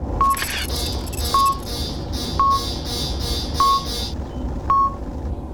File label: radar